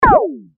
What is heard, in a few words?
enemy down